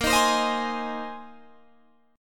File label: A sharp 9th Suspended 4th A sharp Guitar Open G